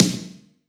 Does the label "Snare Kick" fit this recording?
Yes